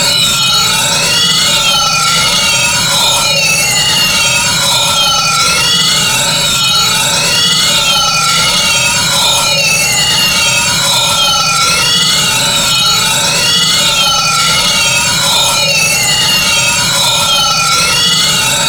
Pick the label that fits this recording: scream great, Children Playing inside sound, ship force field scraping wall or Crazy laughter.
ship force field scraping wall